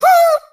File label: "woo", voice